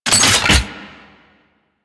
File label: Secondary Mech, Weapons; Kuva Hek; Warframe